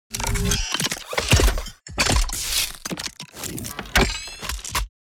Cybernetic transformers robot transformation

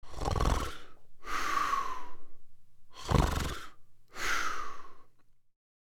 Loud snoring man sleeping; snoring sound effects; gfxsounds

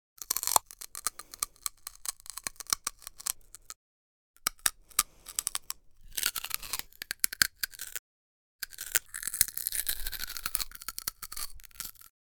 Cartoon biting and eating crunching

